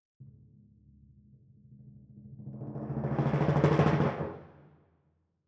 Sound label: Timp Close